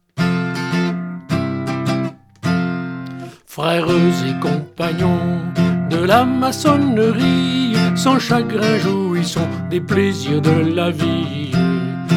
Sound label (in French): Chant d-union